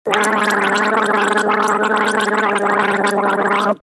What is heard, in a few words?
Gargle